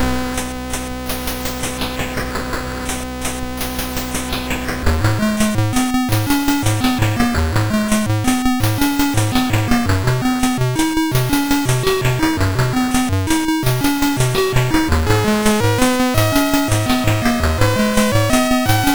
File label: sid, MOS6581R4; Future Shock